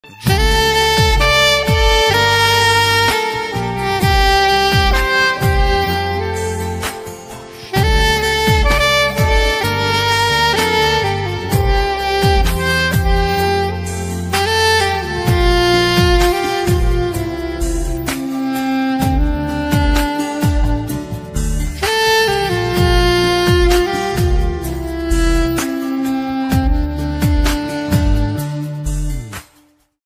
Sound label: christian ringtone music; jesus ringtone